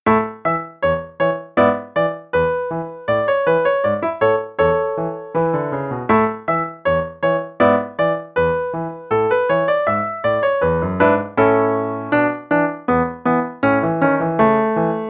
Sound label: Italian Children's Game (no click, both parts, qn=160); Level 2A Performance - page 22